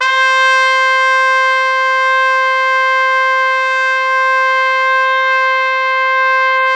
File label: C4, Pop Brass; Best Service ProSamples vol.19; AKAI